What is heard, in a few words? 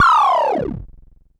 Perc Synth Raw